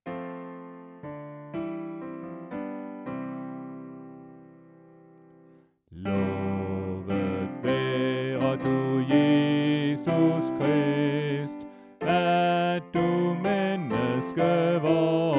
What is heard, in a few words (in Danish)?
Lover være du jesus krist Alt; oevefiler